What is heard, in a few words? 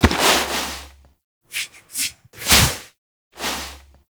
Wolf Foley Pet; Animals; Duviri